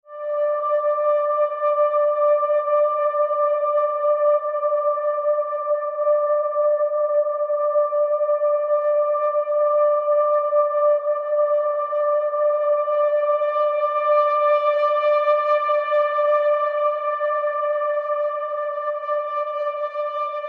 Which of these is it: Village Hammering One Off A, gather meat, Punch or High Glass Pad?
High Glass Pad